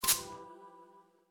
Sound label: Detonator Burst; blek; android; wii u